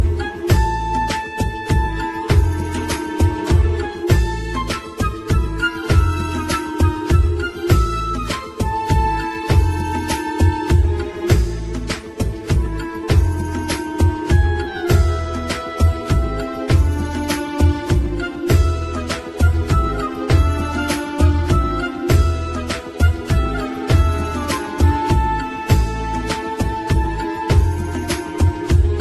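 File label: ringtone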